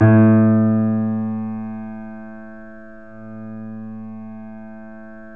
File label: 3D Pianos, Boesendorfer; E-MU Producer Series Vol. 5 – 3-D Audio Collection